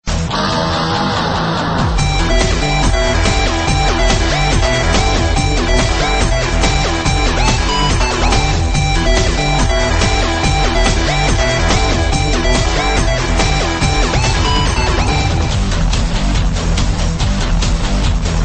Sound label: french string; ringtone